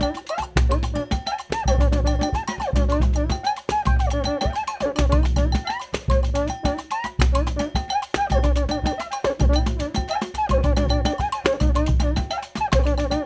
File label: RIO, Rio Batucada